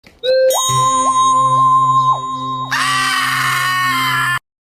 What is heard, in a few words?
big bird scream; dutch